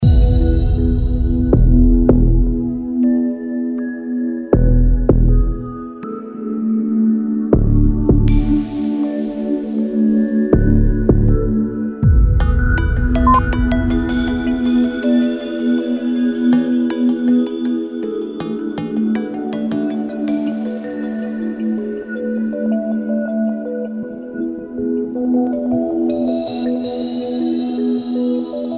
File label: blue planet loop